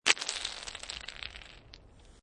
Snow And Ice Ice Fragments Falling And Sliding With Long Tail; Ice Sound Effects